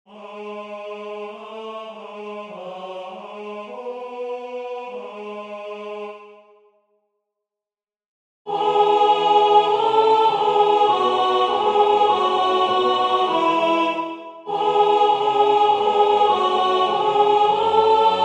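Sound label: Soprano; F.Liszt; Crux ave benedicta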